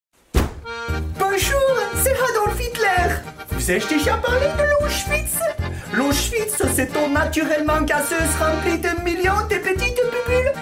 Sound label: nhhhh